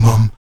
VOX MUM A 2E, Afro Chants; Roland LCDP11 Africa VOL-1; Stacc Mums

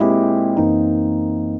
CHORD, Drum & Bass, 150 BPM

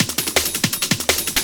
Moo Shuffle, breaks

